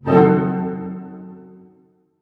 HARP HITS F# DM, Orchestra; Sound On Sound - Peter Siedleczek's Orchestra

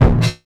BD, Drum & Bass, 160 BPM

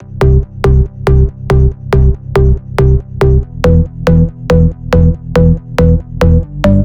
140BPM E; Vengeance - Essential Clubsounds Vol.4

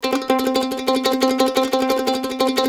CEE TRILL C L, Blues Guitar

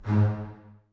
Basses G#2, String Ensembles; Miroslav Vitous; LINKS ORCH

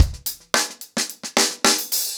Hip Hop 110BPM, acoustic drums drumloops

